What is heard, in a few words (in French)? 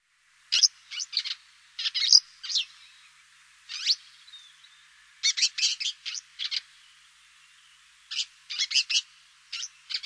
Hirondelle de rochers, oiseaux; birds